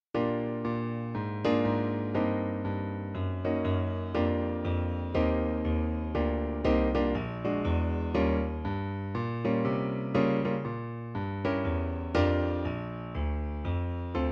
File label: 12 bar blues piano